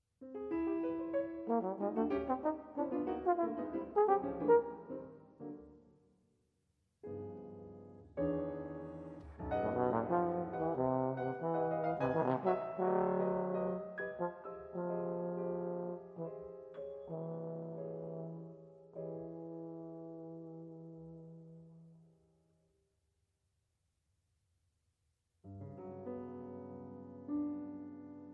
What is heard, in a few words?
Four Pieces For Clarinet & Piano - III. Sehr rasch